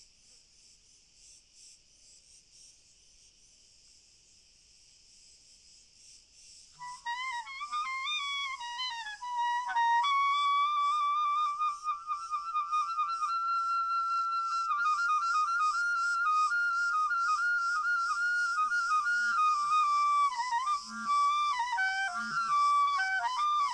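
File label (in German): Frei Obertone Spiel Grillen Chor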